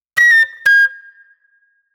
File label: J-Funk Flute, Call And Response